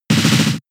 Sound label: Hail turn damage; pokemon sfx gen 6 attack moves xy oras